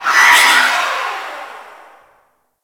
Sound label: Drone Vacuum Pickup; Warframe; Corpus